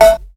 PERC METAL; Big Beat Samples & More; AKAI

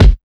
HARD 130 BD, Big Beat Drumloops; USB Soundscan vol.25; AKAI

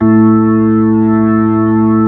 B-3, Keyboards

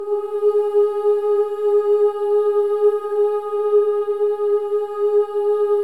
BOYS OOS; Spectrasonics; Symphony of Voices

